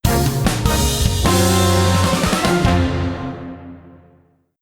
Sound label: Defeat; Pokemon Unite